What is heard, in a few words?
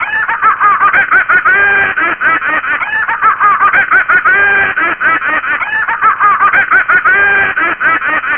Laughter